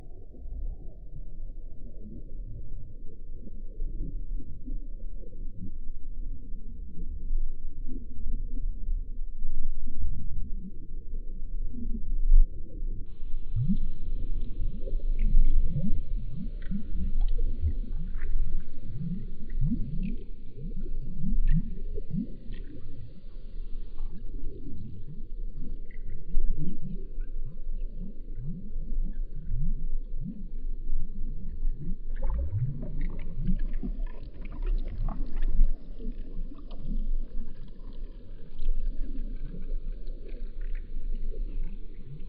Bubbles real slow

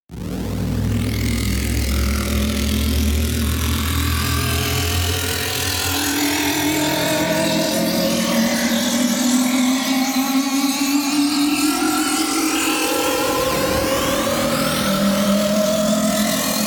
Alien Riser

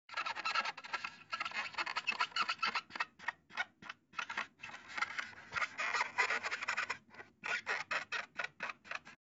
Scratching Rubber Skin

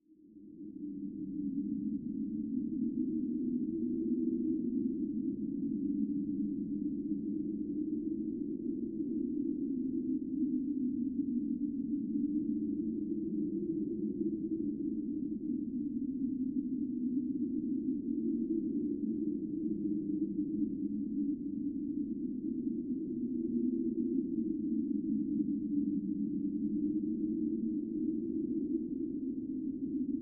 Draft And Wind Synth Indoor Resonant 3osc

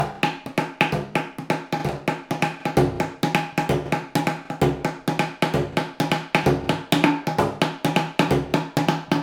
Improv Percs 2 Bars 130 Bpm

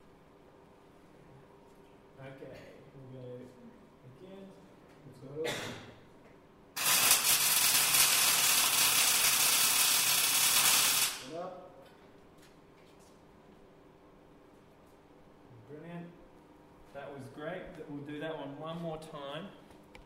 Industrial Welding Long

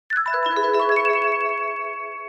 Paris Cdg

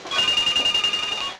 Supermarket

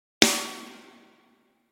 Snare

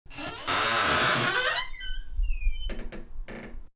Door Creak

